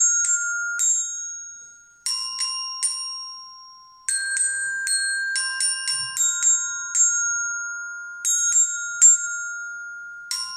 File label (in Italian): samba